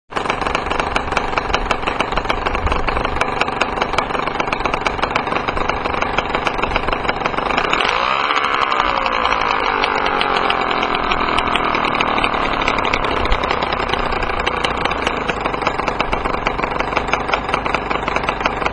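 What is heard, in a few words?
nsr exhaust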